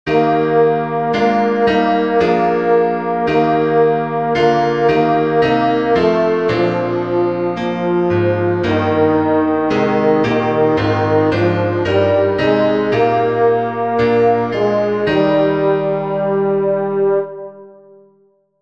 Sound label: coena domini tenor; Draw Near and Take the Body of the Lord